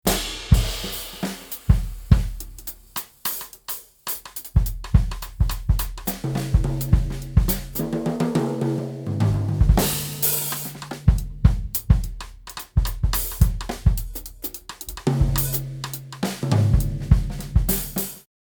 drum recording, drummer, drum solo, jazz drummer, jazz; studio deichtv schlagzeuger; funkydrum